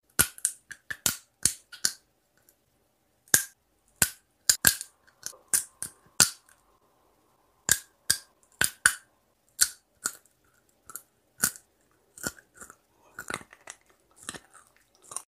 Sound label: Eating seeds